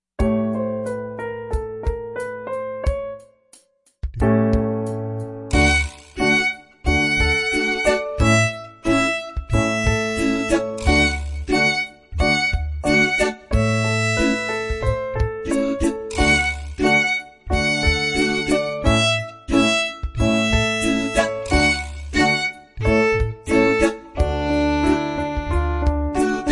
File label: violin; heypr for violin bk 1 bronze